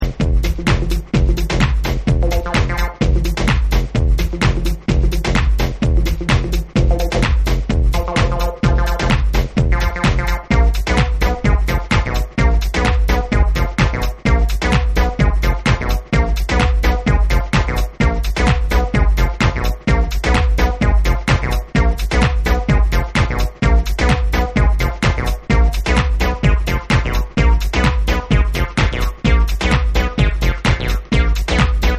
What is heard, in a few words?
Instrumental